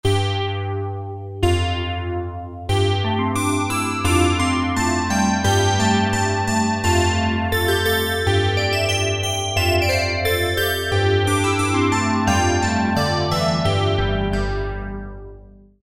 BellChime, Roland MKS-50; Factory examples

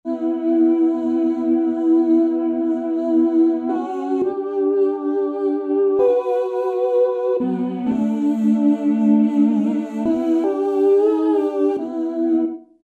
5th Vox; Roland SRX-05 Supreme Dance

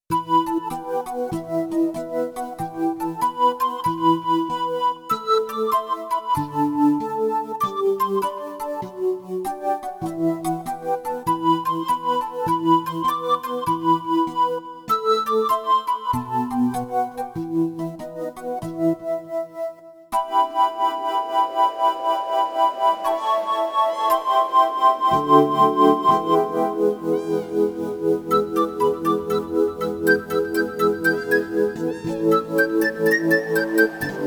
Synth Piper

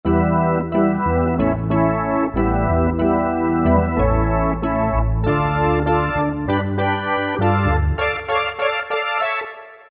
Slow Rotor, Roland; Roland PN-D50-01

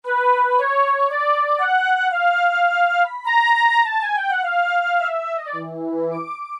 Dtn Flute, Roland U-110; Factory Patch

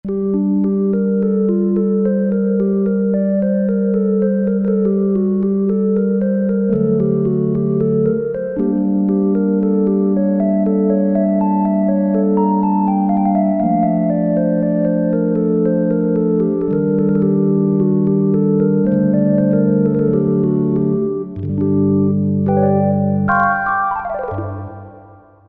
Bar, Rock Keyboards; Greytsounds Philip Wolfe's Rock Keyboards

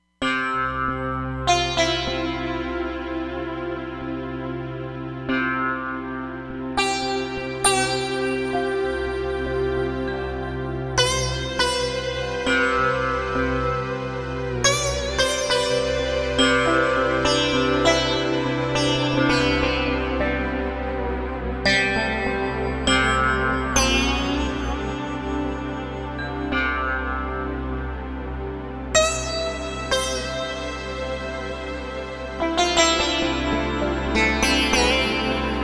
FS Sitar, Roland Fantom XR

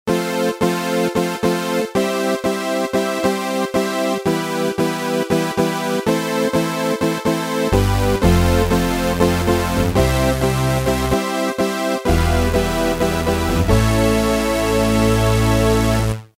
fat stack; Roland M-DC1

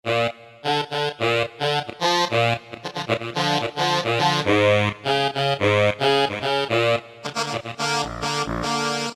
Squeeze de Sax; Roland PN-D50-01

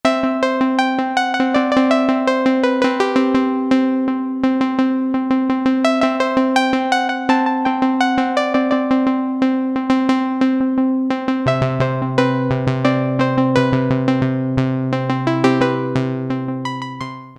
Punchy Harp, Alesis Micron; Factory Programs

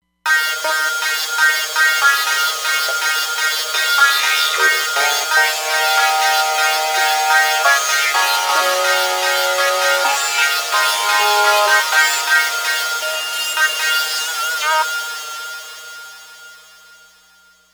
Metal Vox Box, Roland Fantom XR

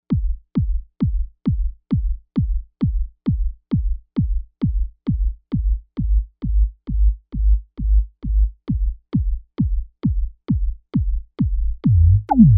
Kick Loop, Novation UltraNova; Factory Patches

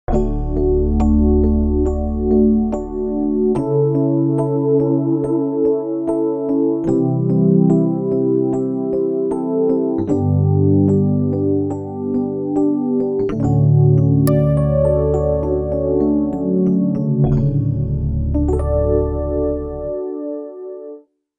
Stiky Rhodes; Factory Patches

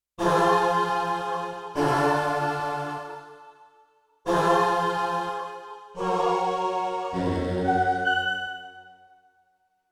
Filter Choir, Yamaha TX16W; Orchestral Performance 1

